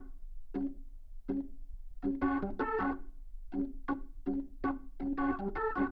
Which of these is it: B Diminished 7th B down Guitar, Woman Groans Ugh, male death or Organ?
Organ